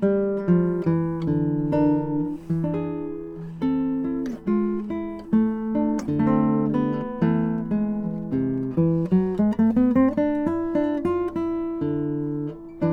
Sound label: gitara, Neumann TLM170